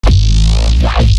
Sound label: Growl Bass; neuro; BIG5AUDIO